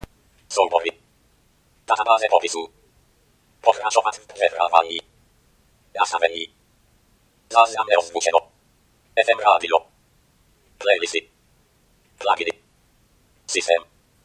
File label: rock menu